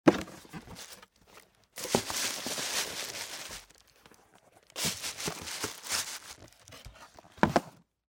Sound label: BOX SHOE